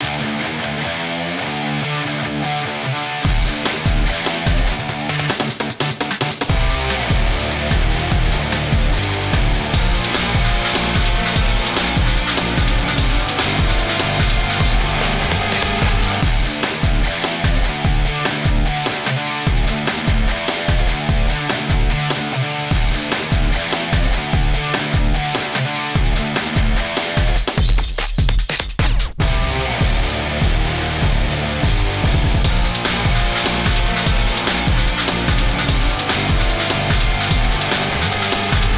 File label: BGM; dairybeatboss